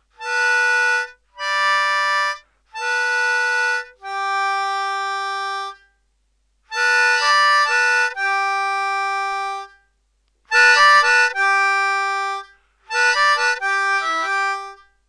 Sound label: cajun